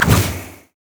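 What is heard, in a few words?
blue minion wizard basic attack; LoL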